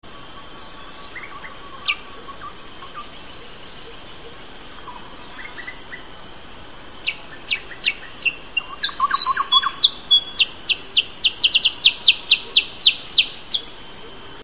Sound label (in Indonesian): burung cep cep atau cucak kurincang